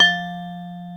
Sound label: F2 vibe, vibraphone; InVision Interactive; Lightware VOL-1; Instruments & Percussions